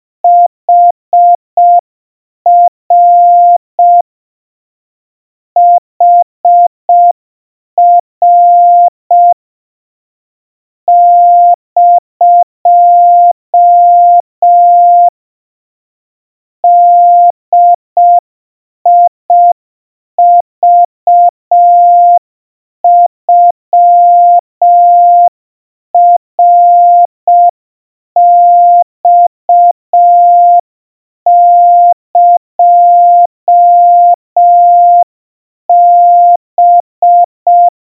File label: kamyu 20 cpm 700 Hz, Morse